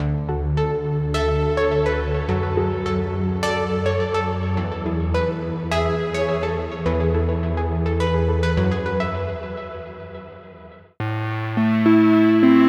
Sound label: Ambient Downtempo